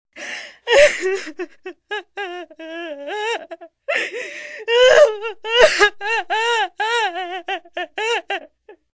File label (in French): Pleurs femme tristesse